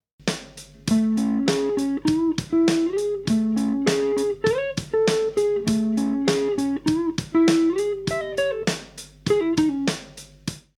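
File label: full speed, rock guitar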